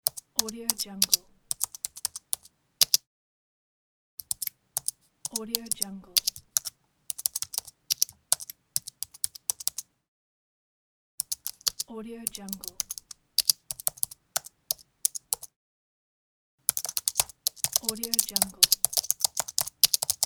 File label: mac keyboard typing